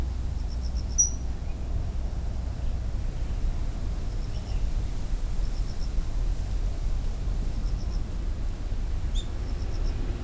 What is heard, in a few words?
warbler pale legged leaf Phylloscopus tenellipes